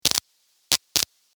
sparks, Electric Spark; Single Spark, From Guitar Cable; Zaps; Electronics

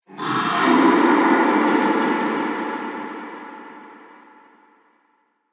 Dragon Boss Monster; Monster Sound Effects; Fantasy; Andrew Fleming

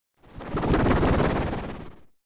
Ufo Hover Sound; Spacecraft Sound Effects; science fiction sound effect; star wars / trek style games; Andrew Fleming

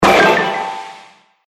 Firework Magic Hit

